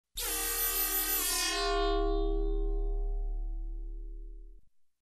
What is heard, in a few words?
Resonant futuristic laser sound